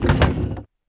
Ship Cannon Move; Civ4 warlords

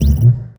menu marked open

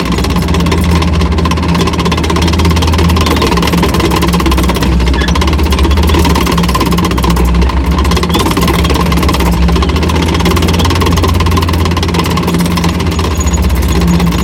tracks light speed, tanks; War Thunder

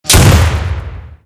tank hit; sfx tanks

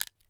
injector put away; Escape from Tarkov

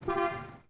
Horn; Civ4 beyond sword

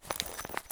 pouch open; Escape from Tarkov

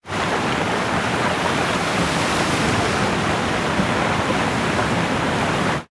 damage hits explosions, Warships

